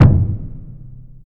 big room kickdrum sound d key, kickdrum sound tuned to the D note